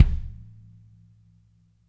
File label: CMP KIK, KLANKY KIT; Spectrasonics; Burning Grooves CD2